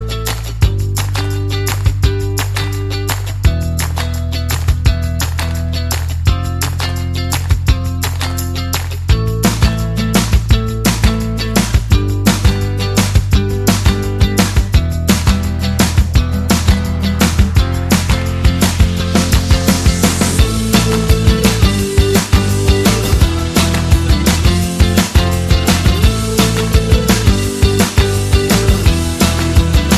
Upbeat Funk Pop

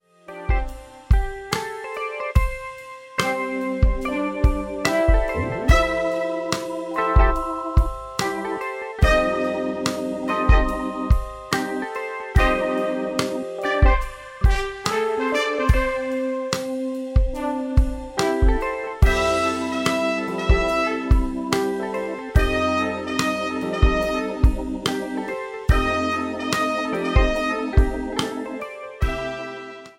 Smooth Jazz; RINGTONE; MIUI12

